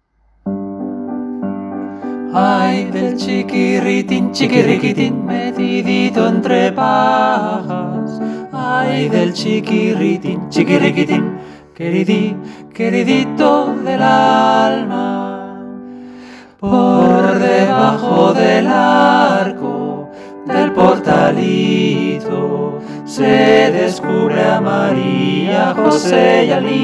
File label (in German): Tutti; Ay del chiquirritin